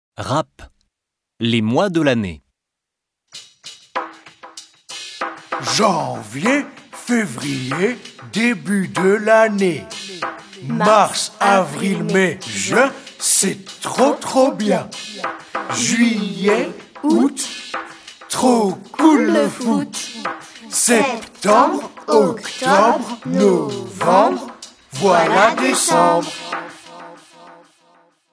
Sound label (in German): Franzoesisch Monatsrap; Grundschulblog